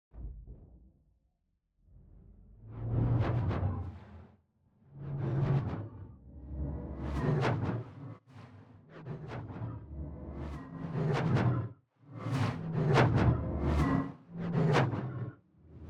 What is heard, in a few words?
Scifi Whooshes Vehicles movement background; Dizzy Duck